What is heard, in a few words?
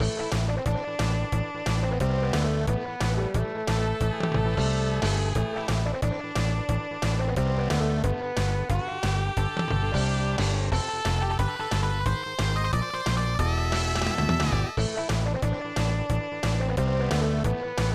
Warning; SWAT Kats; SNES